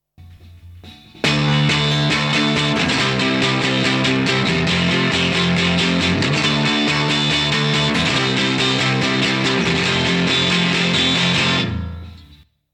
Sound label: guitar